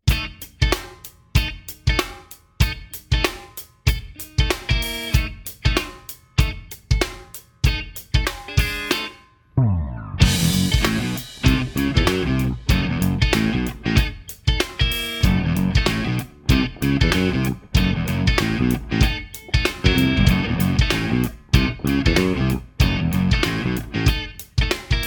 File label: funk